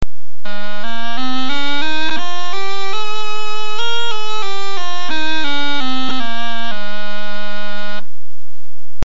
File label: chanter